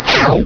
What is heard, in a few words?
LAZER